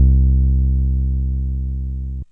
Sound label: TB303 TRI, ROLAND TB-303 BASS; Rubber Chicken Software Sample Bank